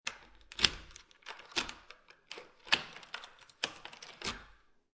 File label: Lock Insert Key Unlock And Lock, Household, Lock, Insert Key Unlock Door, Lock Door, Than Remove Key